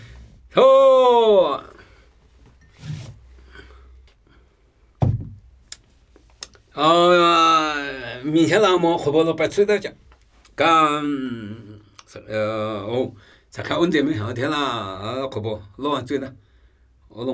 Namuyi Tibetan Ritual Chanting

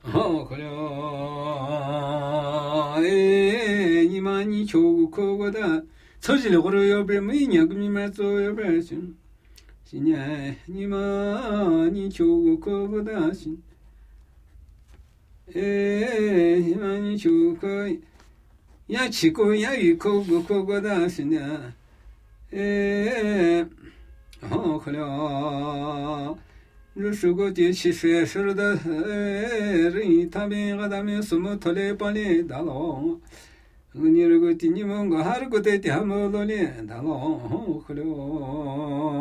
Namuyi Tibetan Ritual Chanting; Ru lu tche su

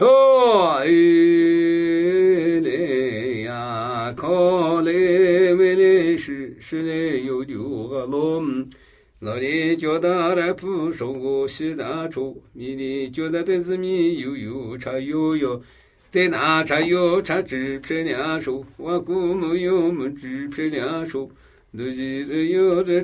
Namuyi Tibetan Ritual Chanting; Drumi mu dzo tso su

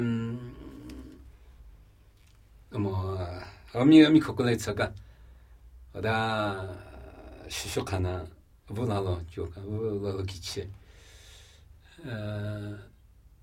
Namuyi Tibetan Ritual Chanting

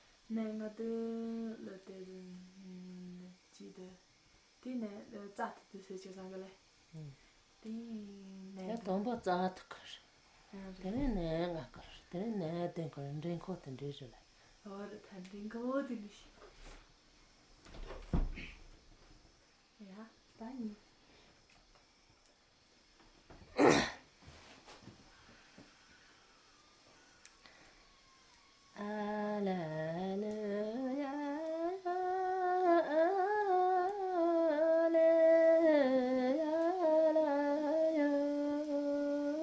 collect fodder song; Sman shad

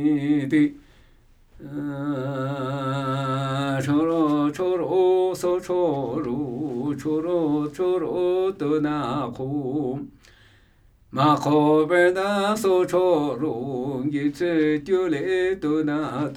Namuyi Tibetan Ritual Chanting; Phi tca pi su

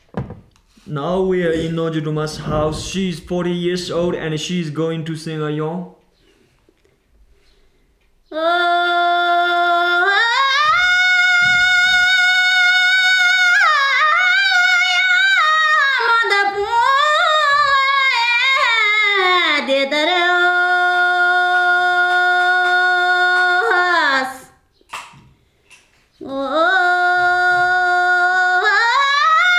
Nyi shar Folk Song

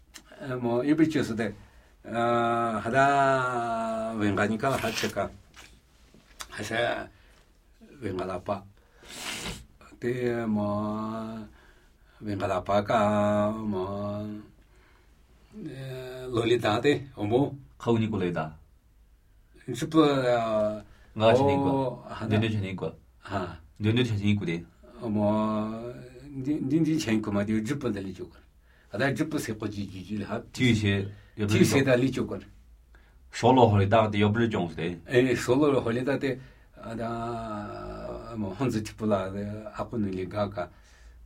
Namuyi Tibetan Ritual Chanting

